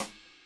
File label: HiHat